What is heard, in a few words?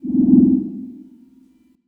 Power